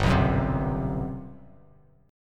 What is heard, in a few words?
G Major 11th; strummed; JGuitar